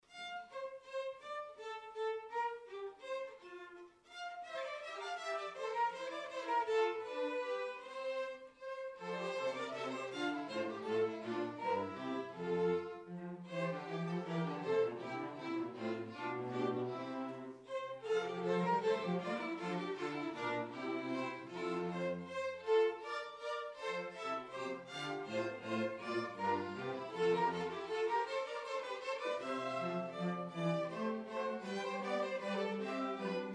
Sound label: Orchester Allegro; Hitzacker; Purcell